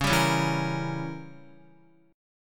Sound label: Db Diminished 7th, Guitar; strummed; x,4,7,6,4,6; 4F,4A,5C,5G,5C,6E